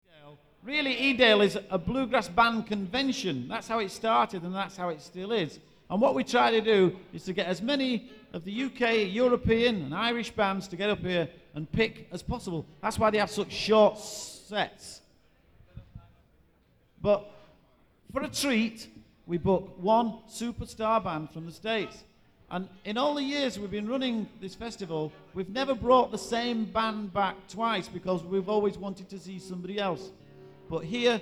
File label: Intro Fiddle Tune; Edale